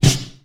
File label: 80s natural snare f key; snare drum samples